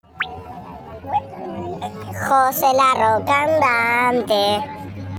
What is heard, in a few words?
song